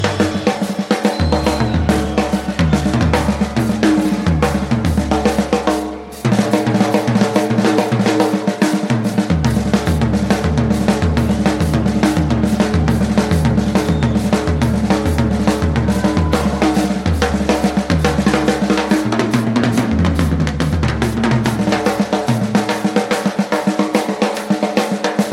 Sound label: Drums; December 31, 2012, Beacon Theatre, New York City